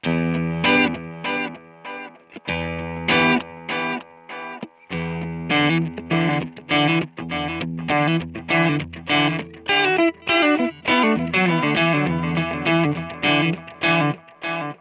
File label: Tape Delay; Harley Benton Dual Effect Duality